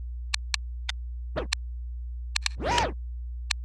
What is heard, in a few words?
grapple hang